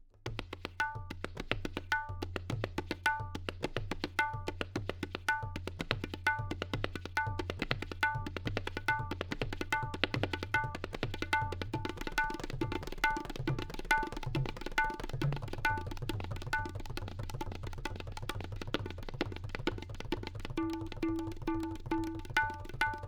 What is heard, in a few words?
Tabla